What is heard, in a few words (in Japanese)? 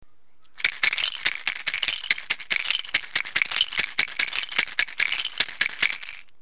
marakasu